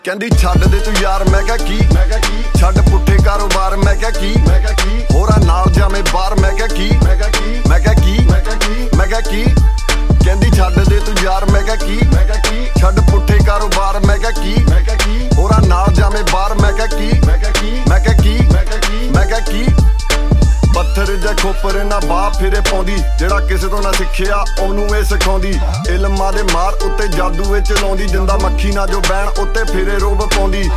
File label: Jatt Punjabi Ringtone